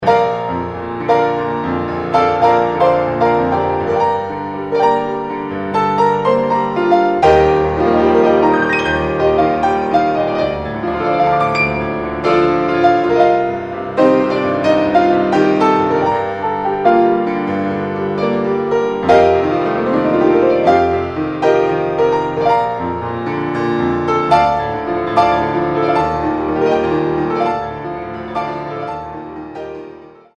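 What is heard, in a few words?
musical entertainment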